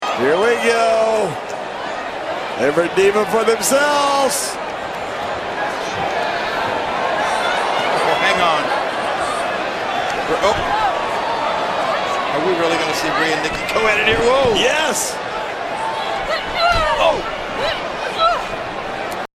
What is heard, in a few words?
pop; bella twins